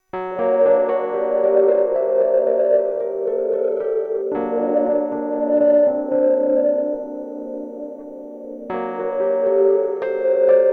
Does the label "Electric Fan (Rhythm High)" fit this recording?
No